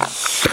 finger suck; Ed Edd n Eddy; Adromulus Pack; EEnE

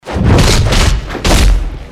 Punches

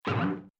Trampoline

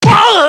punch man

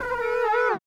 Slide; Ed Edd n Eddy; Adromulus Pack

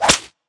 Whip; Ed Edd n Eddy; Adromulus Pack

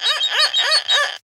Voiceless laughing; EDDY; Adromulus Pack; EEnE

